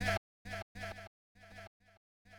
VOX HIT; HipHop Fx; LiveAct Pool 1

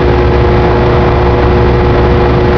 atmosphere; KATANA; Daikatana

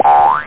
boing!; charley cat down by sea

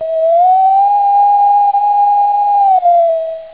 whistle; da capo vol1; instruments; startrek

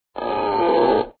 stretch; sheep

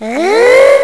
TRANSPORTER; Death from Above

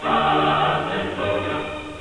Win

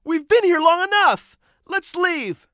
ghost Whining; Keepsake; english; basement

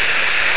sucker suck; Glimmer 1.2

